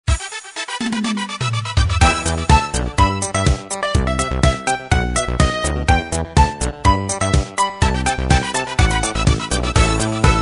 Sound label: funky; Arphiola ringtones; Signature